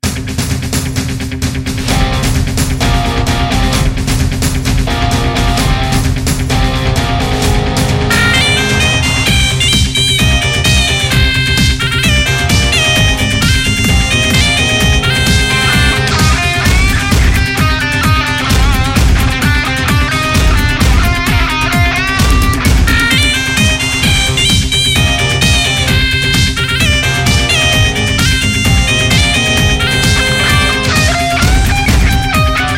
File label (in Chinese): 嗩吶